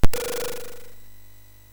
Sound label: SFX, MOS6581; Narco Police; hvsc